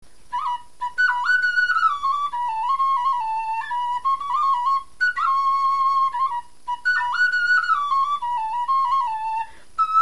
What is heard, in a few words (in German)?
melodie, knochenfloeten